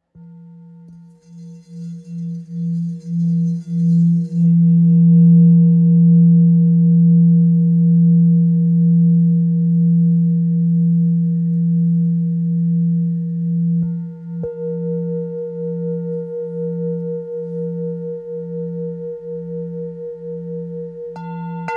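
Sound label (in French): Bol ancien fa, bols chantants tibétains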